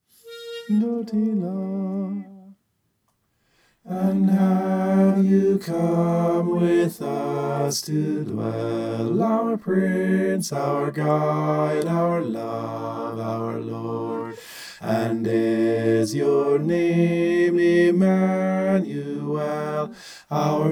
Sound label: bass